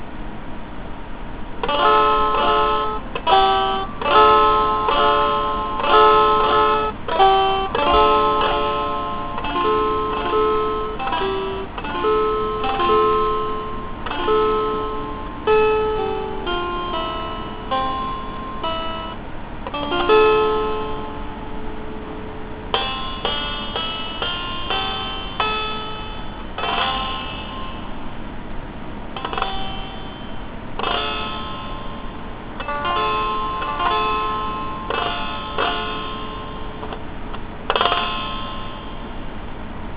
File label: lyre